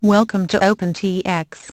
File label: tada; Frsky